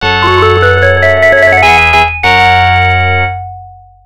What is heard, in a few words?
Lose; F-1 Dream; arcade